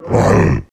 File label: attack, male zombie; nmrih; zerosiki; slender